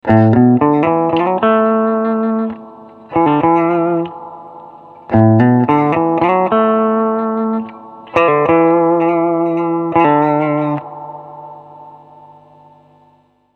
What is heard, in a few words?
Accutronics Sound